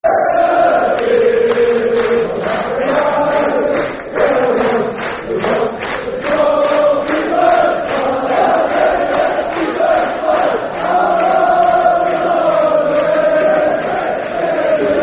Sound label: Football Chant (Soccer Songs)